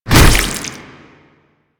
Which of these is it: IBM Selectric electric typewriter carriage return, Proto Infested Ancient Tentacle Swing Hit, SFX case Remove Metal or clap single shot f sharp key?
Proto Infested Ancient Tentacle Swing Hit